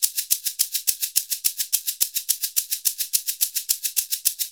SHK, Shaker; Univers Sons; Basicussions

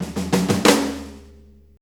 90 BREAK, DRUMS

